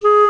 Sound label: WINDS & BRASS